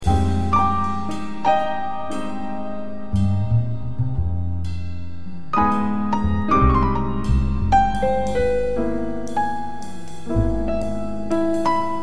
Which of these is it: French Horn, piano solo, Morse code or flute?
piano solo